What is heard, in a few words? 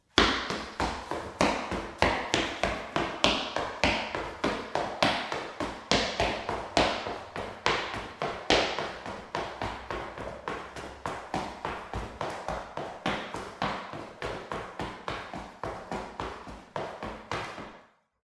foley running tiled floor reverberant corridor